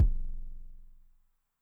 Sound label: BD MOD Pitch Extra Low, bass drum, 909; classic machines; 909mod